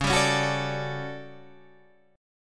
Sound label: A 13th C sharp, guitar chord